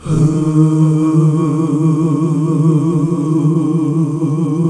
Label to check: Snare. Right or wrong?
Wrong